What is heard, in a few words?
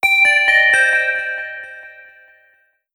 Jumping, notifications; Oppo; Real R801